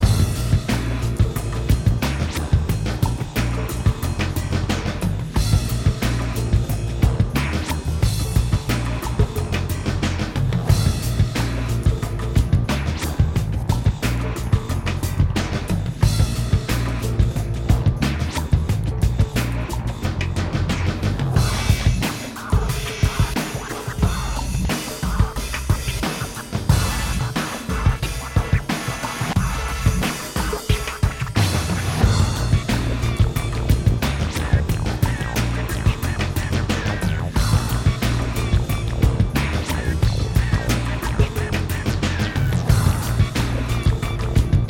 Rhythm; PixelJunk Shooter 2; ps3

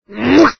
Globe Bird Selection

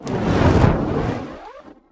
In Fire; Goddess of Trampling

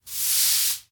Brooming; Still Life

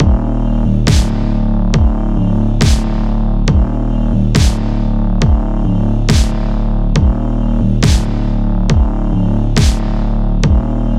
MUSIC STORY DESPAIR

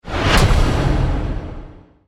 postmatch results; Might and Mayhem

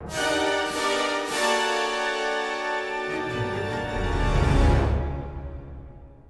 Music - Stinger; Spider-Man 2 The Game 2004 PC

